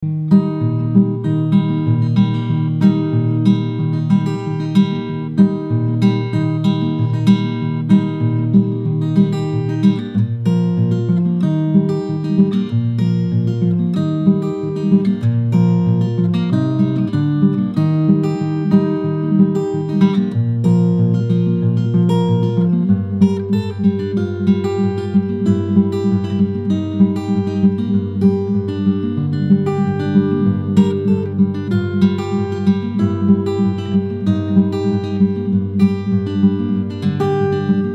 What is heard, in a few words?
acoustic